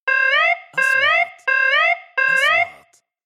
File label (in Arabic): صفارة إنذار; transitions